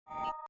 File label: button click